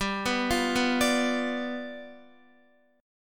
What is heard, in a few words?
G 7th G down Guitar; G7 arpeggiated; JGuitar